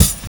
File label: KIT, Dance Mega Drums, HIP